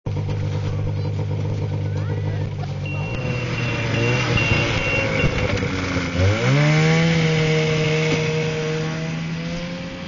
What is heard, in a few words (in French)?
auto motone